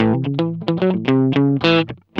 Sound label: GUI, LICKS; 110 LICKS